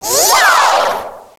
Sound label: yay; DS; ML BOWSERS INSIDE; Fawful